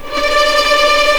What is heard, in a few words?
D5 TREM, VLNS TREMOLO, String; East West; The Ultimate String Collection